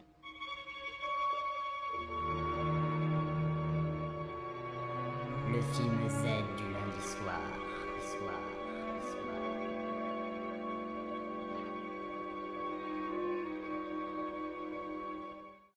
filmz jingle; lefilmdulundisoir

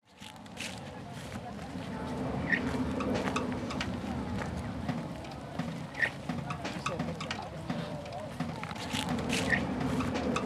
la porte du tunnel randonnee sonore; court circuit 2024

